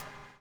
BUBBLE; Spectrasonics - Backbeat

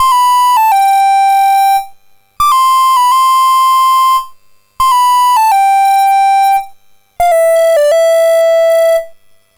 C64 Synth Phrase; 8-Bit; Chiptune; Commodore 64

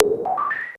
MOOG HAT; AMG - Vince Clarke Lucky Bastard

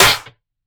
SNARE MECHA

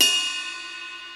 RIDE, DRY; Crypton - DrumBase5000; DRY KITS

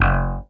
POP C3; Greytsounds; Scott Peers Sound Engineering

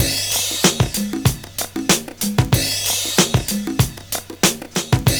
PHASE N PERC; Univers Sons

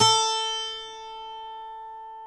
A3 12-ST AC, AC.12-STRING; InVision Interactive; Lightware VOL-1; Instruments & Percussions

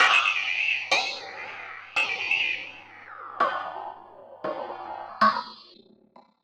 Ring Mod, Cymbal, contact mic; Murf & Ring Mod

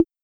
Tom Hi; Vermona Drum

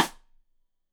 Side Stick Stereo; Deeply Sampled Drums

